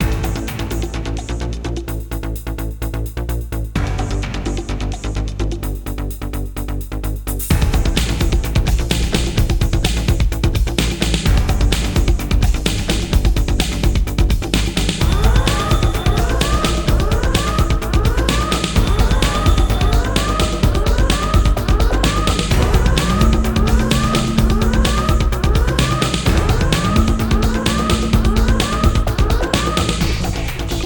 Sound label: battle, music; sc2; 3domusic